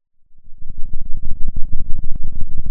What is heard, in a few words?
Sound of a thunderstorm, center